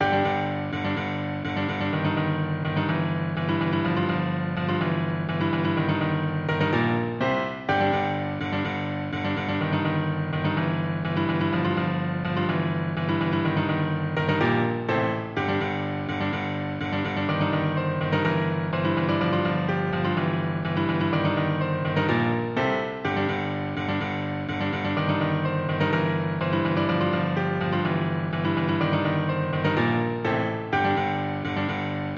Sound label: Piano Duet; The Avengers